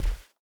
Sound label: bear footstep sand hard; Rust